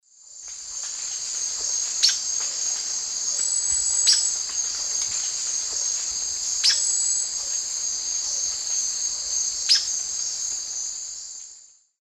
Sclerurus caudacutus, call; S. America; Eastern Lowlands

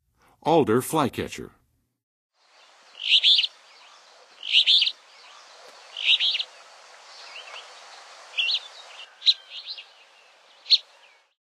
Alder Flycatcher; North America; Western USA; Western USA Songbirds

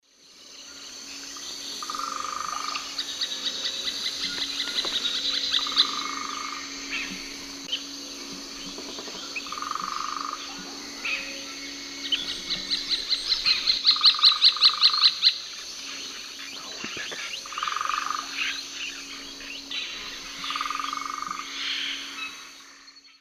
Veniliornis passerinus, drum and call; S. America; Eastern Lowlands

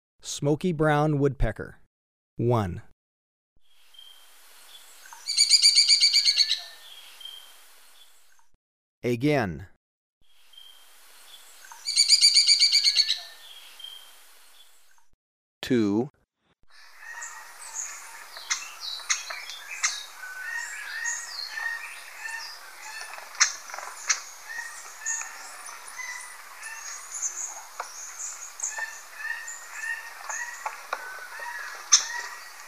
Smoky-brown Woodpecker, Ecuador; S. America

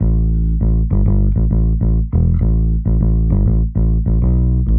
X Bass, Rock Bass

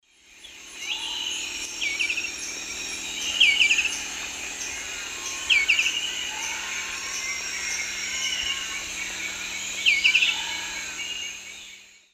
Zimmerius gracilipes, dawn song; S. America; Eastern Lowlands

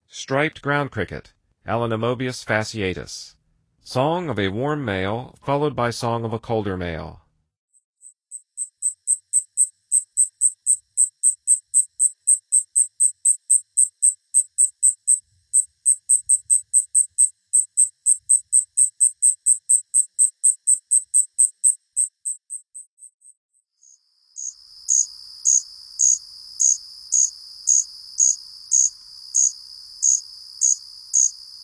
Striped Ground Cricket, Songs of Insects; N. America

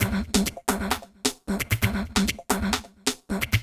Vox Perc, Dance Beats

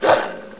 DOG, animal; 动物

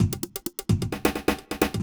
electro kit 130

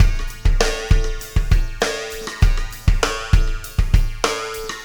ZERO GRAVITY BEATS

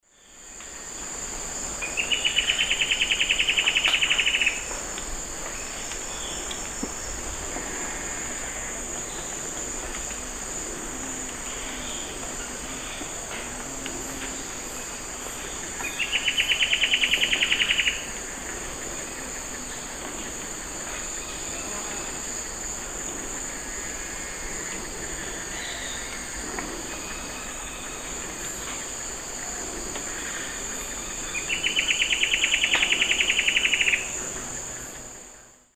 Myrmotherula brachyura, song; S. America; Eastern Lowlands

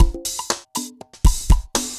dancefloor perc 120 bpm

